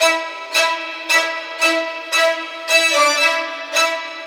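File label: STRINGS 1, 112 bpm, Funk; USB Soundscan vol.41; AKAI; Roots Funk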